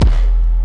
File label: Bass Drum Slammed; Lost Tapes From Mars